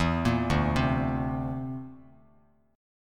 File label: D 7th Suspended 2nd Sharp 5th D, Bass Standard; D7sus2#5 arpeggiated; JGuitar